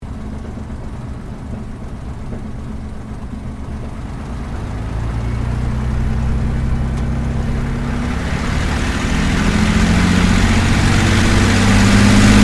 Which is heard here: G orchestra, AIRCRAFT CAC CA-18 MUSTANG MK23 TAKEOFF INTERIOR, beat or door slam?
AIRCRAFT CAC CA-18 MUSTANG MK23 TAKEOFF INTERIOR